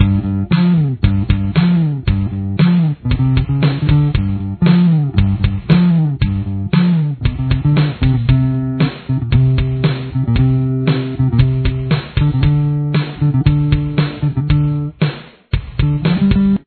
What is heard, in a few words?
bass